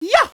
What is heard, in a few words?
yah; DS; ML BOWSERSINSIDE; Mario